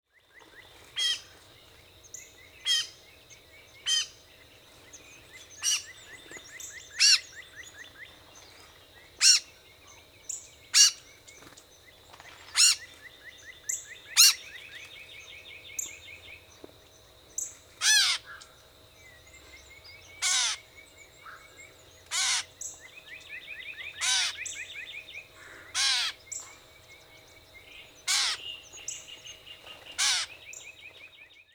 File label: mews